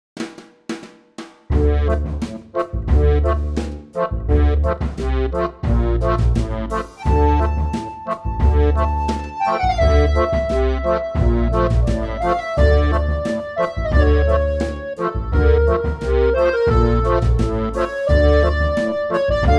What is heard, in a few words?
Back Alley Groove, Vital Accordion; LukeMans; Vital Accordion includes a dynamic preset which responds to velocity and the modwheel for expressive playing.